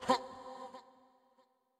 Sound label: Toxic Mask Small Effort